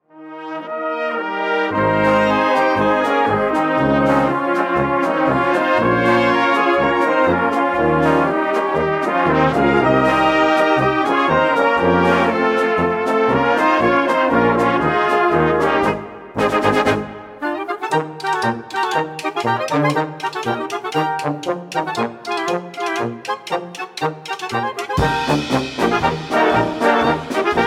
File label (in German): Blas Musik